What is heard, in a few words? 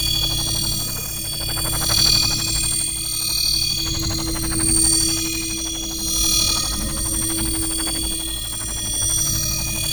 Exterminate Key Loop; Warframe; Nineteeen Ninety Nine